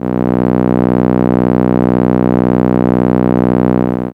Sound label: Contra B1, ARP Solina, Contra Bass; musicradar-synth-samples